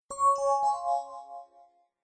Alert Emerald; Samsung SGH-i617 BlackJack II

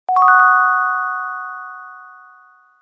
Alert Chime; HTC Touch Viva WM6.5

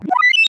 Notifier Magic, BlackBerry; BlackBerry 9000-Bold

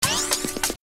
Hiphop MMC insert, Nokia E52

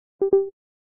Battery caution; Alcatel OT-991D

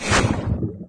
bricks melt; LG GW820 eXpo

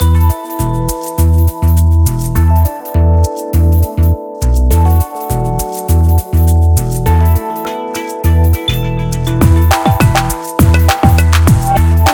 music optimism; Sony Ericsson; A8i; cmcc

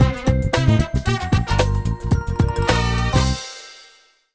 Jazzy power on; Samsung SGH-i320; Power Sounds

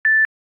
beep once; Samsung; Galaxy J3 Prime

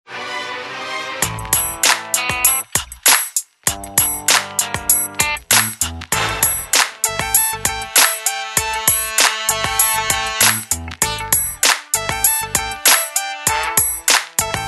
boom

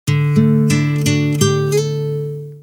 Acc Guit; PlusFourSix

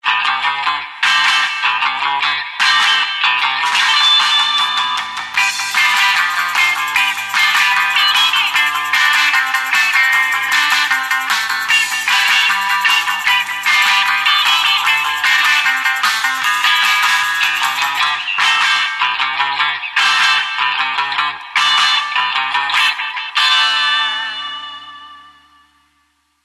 Ocean Waves; Motorola Rival-A455

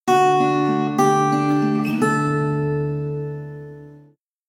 Message; Nokia; 3555

